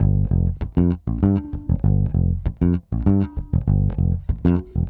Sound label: Smooth Groove Bass, 70s Analog Funk; Sonic Foundry (Sony Creative Software); What it Is!